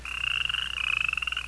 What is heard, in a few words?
Frogs; Koji the Frog 2.0.1